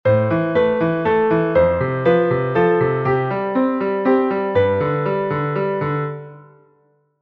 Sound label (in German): simple melodie, am klavier